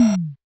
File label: Realistic Concertmate-670 Rhythm Tom Grinder; Rhythm Layered Kit